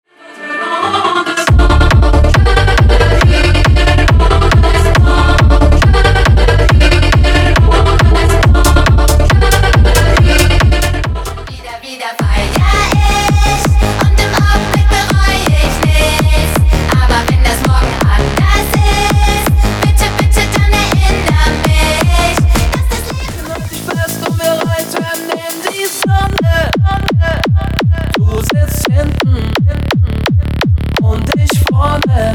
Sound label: hypertechno